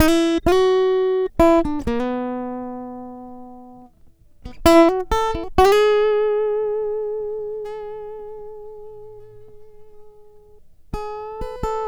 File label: Guitar, Trip-Hop Loops; Sonic Foundry; Rondo Brothers Trip-Hop Loops & Samples; Hooked On Hookers